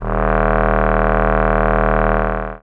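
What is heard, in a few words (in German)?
d#1, Bass Klarinette, MIRAGE; Retro Sampler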